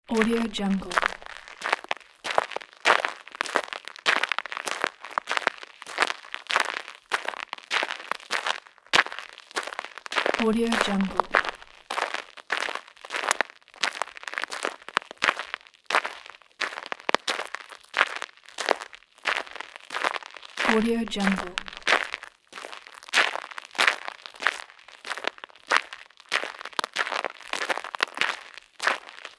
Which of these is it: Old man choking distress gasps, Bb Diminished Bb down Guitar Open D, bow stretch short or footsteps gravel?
footsteps gravel